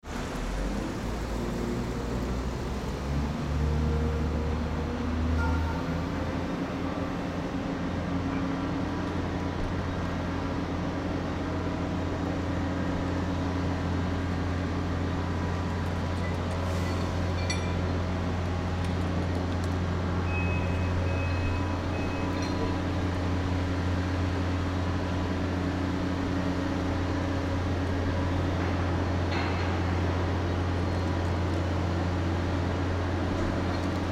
mobile crane

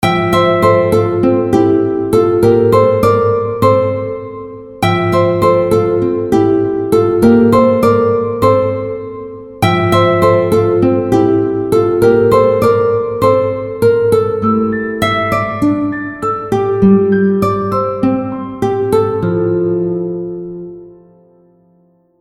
guitar

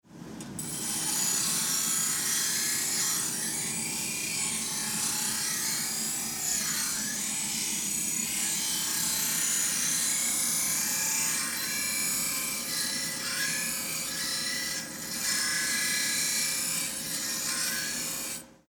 small factory

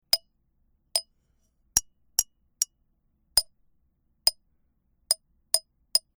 bottle hitting

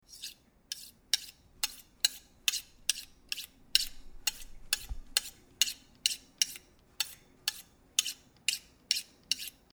knife

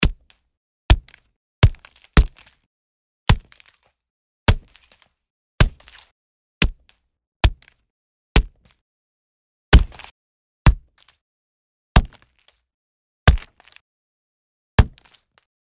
punch

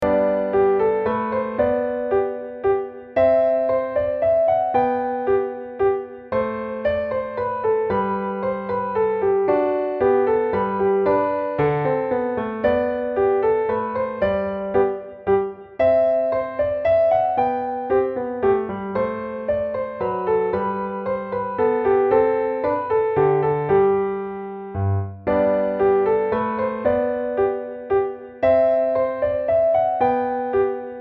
minuet

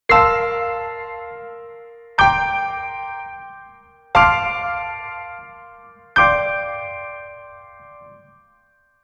piano, jingle; 楽器ジングル

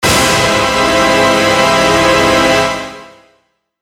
shock

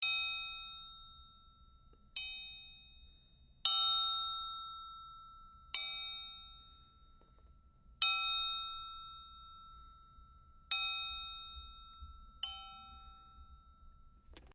triangle

